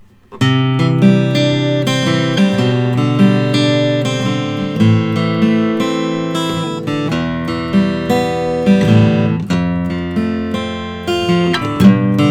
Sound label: collings 000 42 varnish; 2008